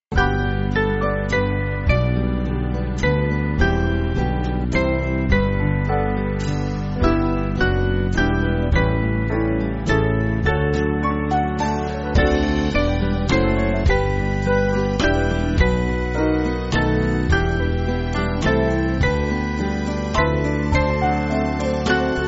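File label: Band, Free Music Backing Files for Churches; Beatitudo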